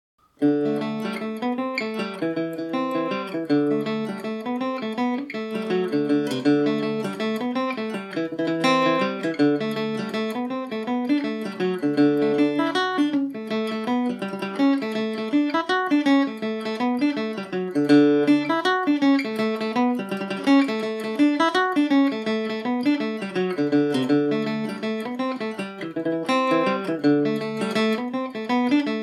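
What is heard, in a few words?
reel D mixolydian, Irish mandolin; road to lisdoonvarna reel revisited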